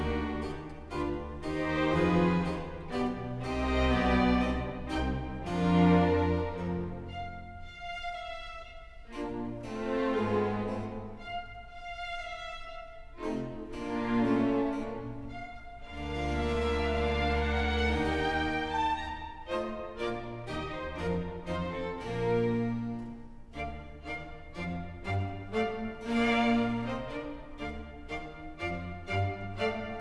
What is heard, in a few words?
classical